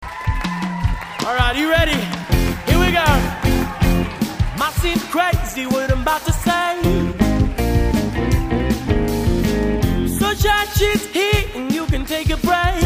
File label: jazz